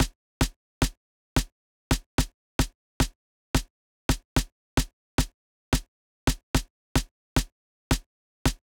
Snare Loop 110bpm

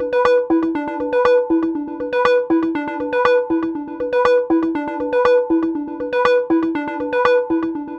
Arp Pluck 120bpm

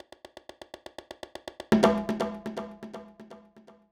Drum Fills 122bpm

